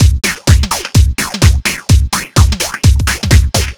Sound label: Funk Fill, Drum Loops 127bpm, Nu Dance Electro; Zero-G; Soundclash Nu Dance Electro; Acidized Wavs; Jumble Funk